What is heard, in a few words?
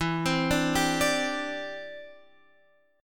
D 7th Suspended 2nd Suspended 4th E